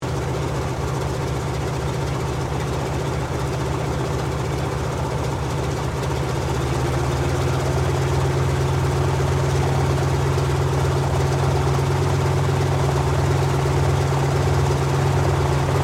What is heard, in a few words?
AIRCRAFT LOCKHEED HUDSON WARMUP, Hudson twin engine light bomber; Airplanes (Sound Effects:Vehicles)